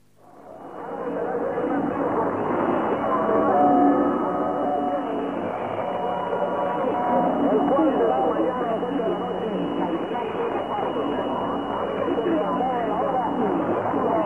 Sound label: mwdx 1230 khz; r valera and UNID